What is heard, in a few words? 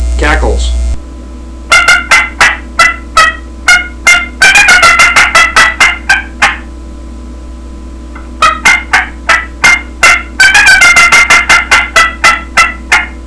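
jag edge cackle, turkey call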